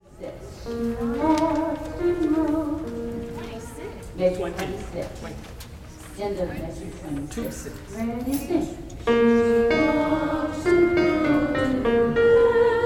soprano

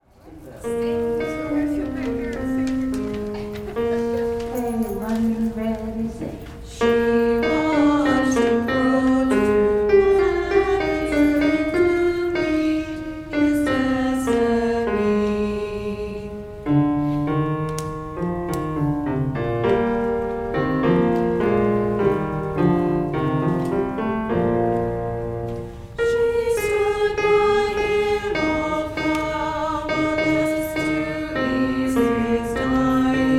alto

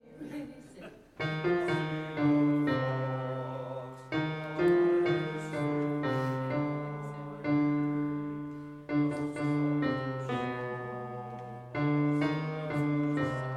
bass; Follow Now, O Shepherds

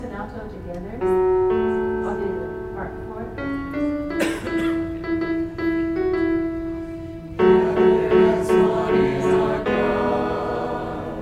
alto and bass; Gather Hymnal

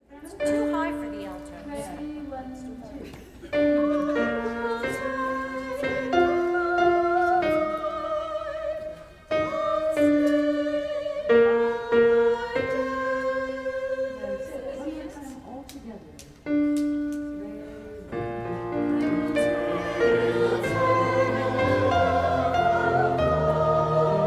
soprano descant; Gather Hymnal

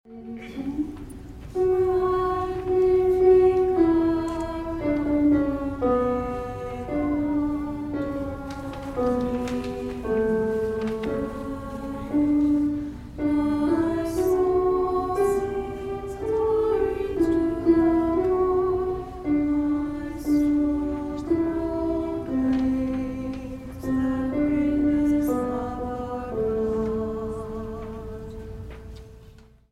Magnificat alto

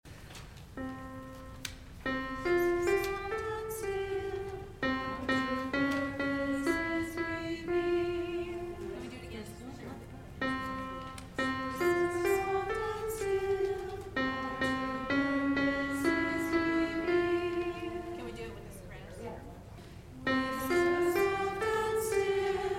alto

